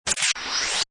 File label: Scifi Broken Sweep; Scifi Production Element - Broken Sweep. Suitable as science fiction film and game production; Science Fiction Sound Effects; Lynne Music